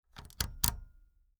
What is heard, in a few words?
Technology Quarter Inch Plug Into Quarter Inch Hole